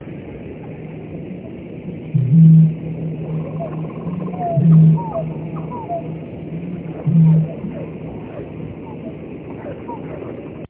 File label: Bittern booms